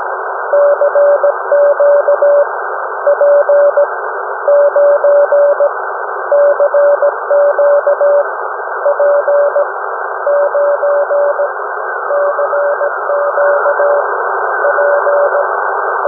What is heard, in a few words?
3581 kHz